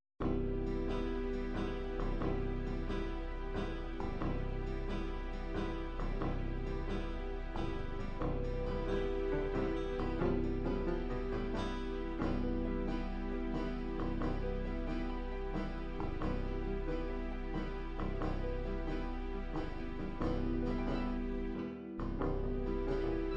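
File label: ballads ballad slow waltz, midi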